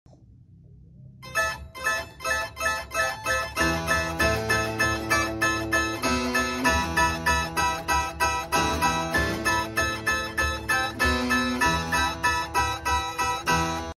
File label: Harpsichord